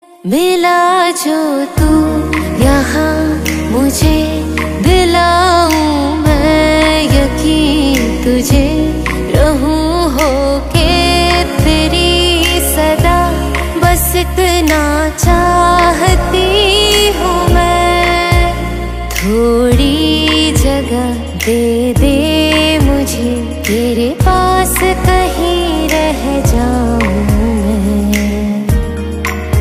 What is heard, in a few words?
female version